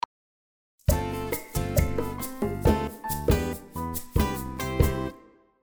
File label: instrumental; STACY THS TROPICAL HOME SERVICES